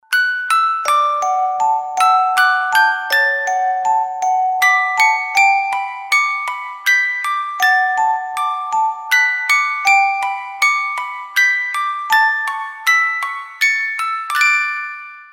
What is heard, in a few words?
music box the first noel